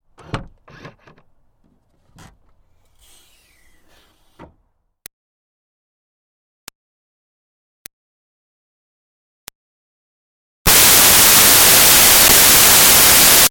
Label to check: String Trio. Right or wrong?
Wrong